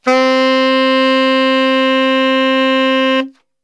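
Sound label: C 2X SAX STR, saxophone; Best Service; Brass Super Section; SAX STRGHT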